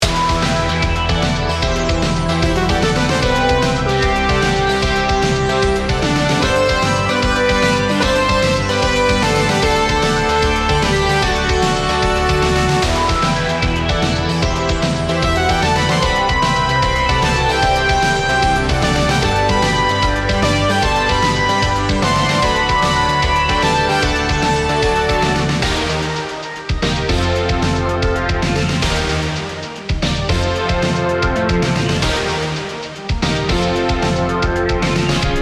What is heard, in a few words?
SYNTHWAVE